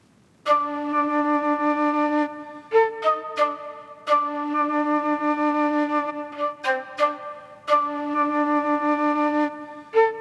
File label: Dark Melody Loop - 133 BPM G Min Flute; Cymatics; Oracle Sample Pack